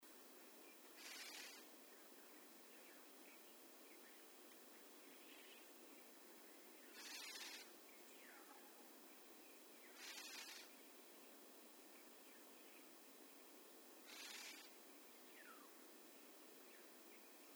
Slender billed Oriole Oriolus tenuirostris invisus, slender billed oriole call